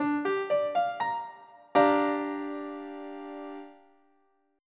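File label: G M7, guitar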